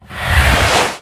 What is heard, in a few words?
tablecloth slide; fashion room sfx; birthday party bash; wii